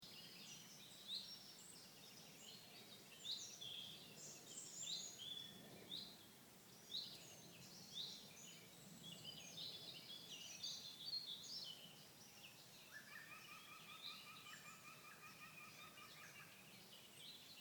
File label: Wuppertal forest